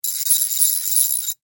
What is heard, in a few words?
Industry Ratchet Turn; Hand Tools